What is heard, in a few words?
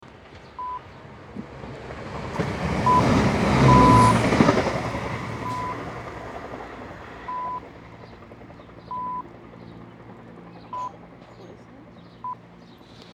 Station Train Pass